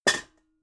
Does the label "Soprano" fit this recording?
No